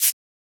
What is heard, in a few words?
Shaker; FLPRO